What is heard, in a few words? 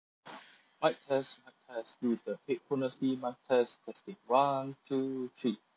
Astrotec S80 Plus Quiet